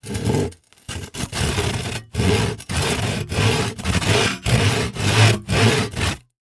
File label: Industry Tool Saw Hack Saw Sawing Into Human Bone